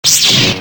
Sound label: sword on; csco; csgo; weapons; sfsword